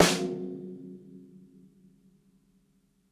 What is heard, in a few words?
ROOMY SNARE HARD; Basement drums vol 2